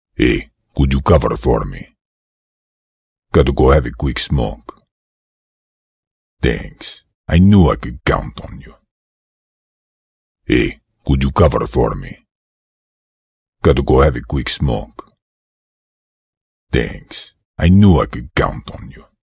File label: Russian Smoker; Holywood